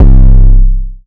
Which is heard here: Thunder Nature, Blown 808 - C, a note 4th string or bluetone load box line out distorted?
Blown 808 - C